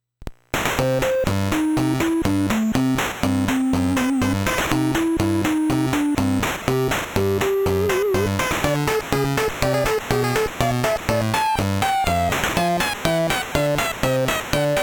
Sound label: battle; rance 8bit